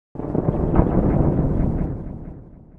NOISE, Production Effects

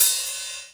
Hat, Ensoniq ASR-X

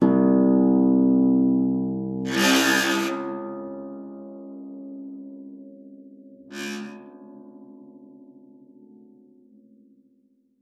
PEDX B1, harp; Xsample VOL-8; HARP+FX

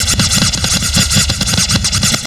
SCRATCH FX L; Big Beat Samples & More; AKAI; OTHERFX KIT

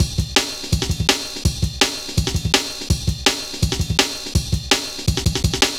Rollin' Ride, Jungle Warfare, Drum Loops, 165.5

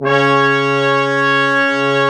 BRS QUIN, Quintet; Roland L-CDX-03

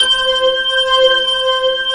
C5, Keyboards Old School

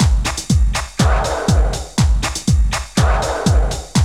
HOUSE LOOP, Ethnic; Zero G - Ethnic; ETH TECH HOUSE

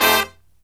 LOW CHORD, Killer Brass Riffs

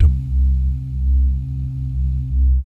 BASS DUMMS, Vocal Planet Jazz FX; Spectrasonics

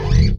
BS CHPSTK, Chapman Stick; Roland L-CD701

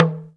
TALK DRM, Heart of Africa; Spectrasonics

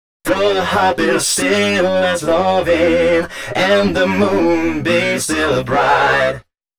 male c#, Dance Ultra Voice FX; Mutekki Media

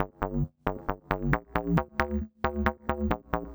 Bass, 135bpm, Nu Dance Electro; Zero-G; Soundclash; Combi Loops; All Square

